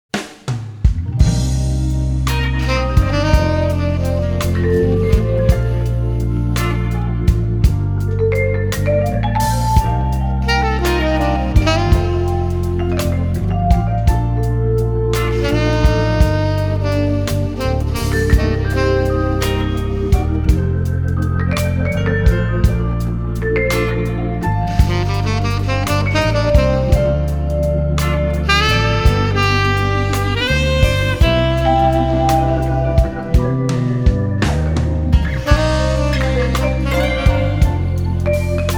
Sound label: Instrumental